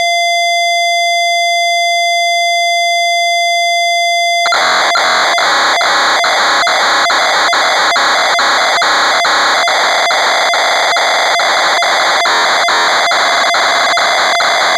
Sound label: BUZZARD